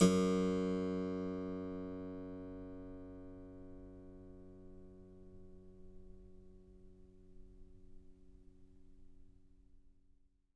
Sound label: harpsichord